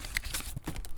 Shoofing Papers; BB Retail Therapy Sample Pack

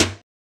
metal hit

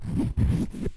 Course Fabric; BB Retail Therapy Sample Pack

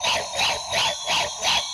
Train Loop, Vehicles; retro arcade

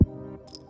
Damp Kick, Drums; BB 2HTC Samples Vol 4

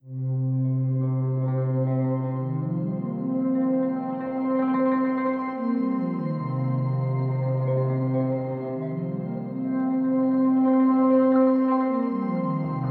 Intro Wash of Color, Pads; BB 2HTC Samples Vol 4 Addendum

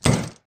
metal slam; wood metal SFX

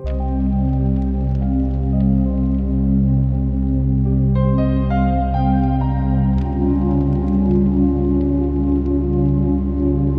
Foggy Pads; BB 2HTC Samples Vol 4

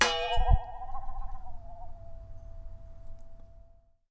Wobble, Japanese Pull Saw

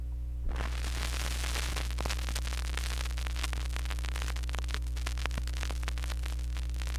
Filthy Record, record fuzzies; Micro Pack

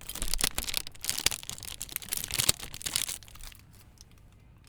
Crinkle Plastic; BB Retail Therapy Sample Pack

